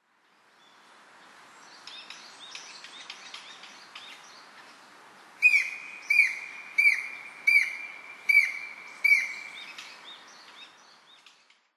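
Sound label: Japanese Green Woodpecker; aogera; kokubunji; koe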